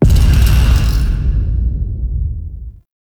Hit; Cinematics; Lotus Gem Part One

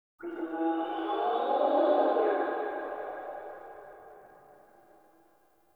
Stag Stampede Vocal Grunts

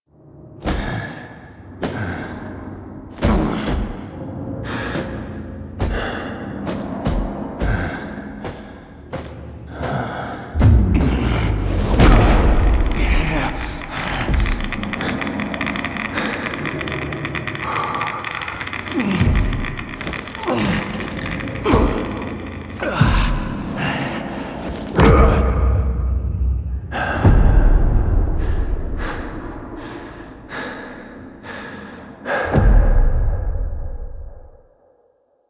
Last Stand Cinematic SFX; Warframe; NineteenNinetyNine; Stage12PartyOfALifetime

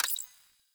UI Mod Screen Activate; Warframe; Railjack; Player Ship

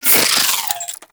Turret Throw Whoosh; Warframe; Corpus; Corpus Railjack; Crp Admiral Agent

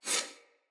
Split Sword Accent, Swords; Weapons; Player; Starter

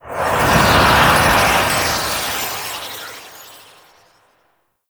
Moon Loot Spawn; Ambience; Gameplay; Warframe; Orokin Moon

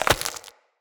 Agaric Mushroom Grow; Resource Materials

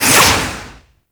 Cannon Projectile Whistle; Pirate; Warframe

